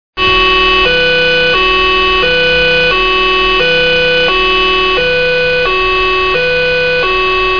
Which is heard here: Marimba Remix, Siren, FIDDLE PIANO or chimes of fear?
Siren